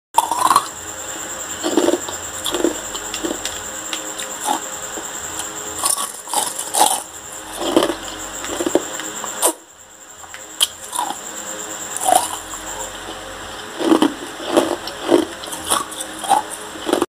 Chewing ice

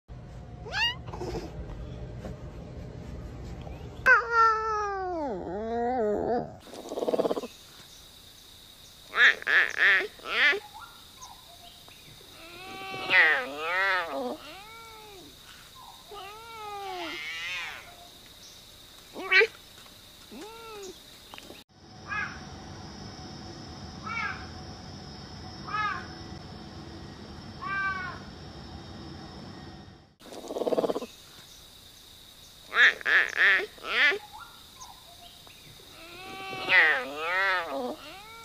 Clouded leopard; پلنگ ابری

